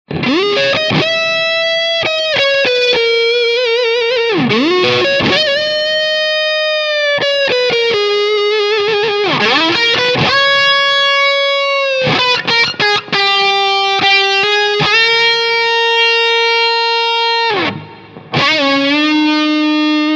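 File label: Modern Dist Les Paul, guitar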